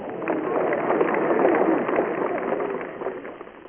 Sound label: clap; cricket